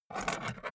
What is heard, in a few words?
scissors pickup